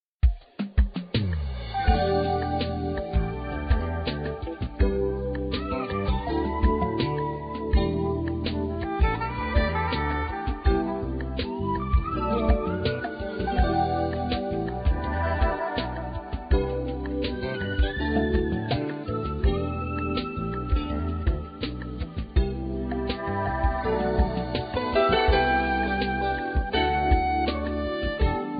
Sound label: Easy Listening New Age Jazz